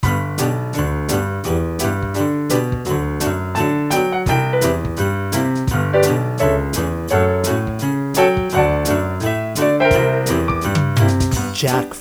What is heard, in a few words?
Christmas Vocal